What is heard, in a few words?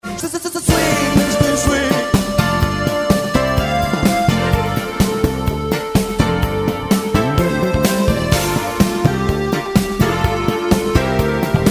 roland d-50